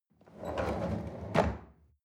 chair wheel roll on floor soft

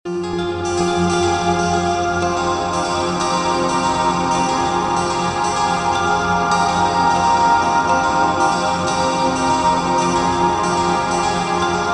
heavenly arpeggios

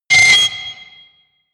distortion

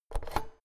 oven knob press in hard

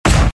short impact

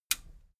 metal button radio push disable

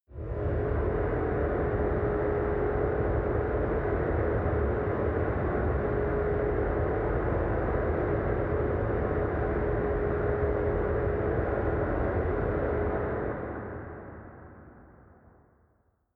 machine room drone

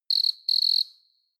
cricket chirp

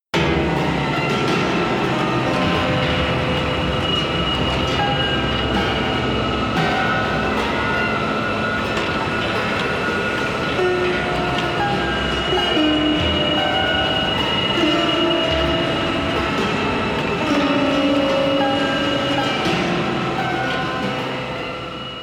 broken piano chaos

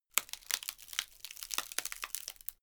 wood break slow

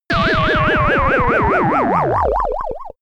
landing ufo

